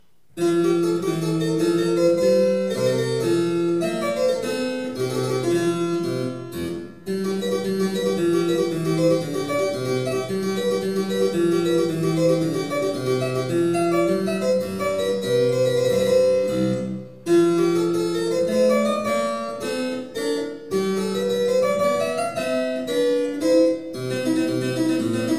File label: Menuet F Cembalo; mozart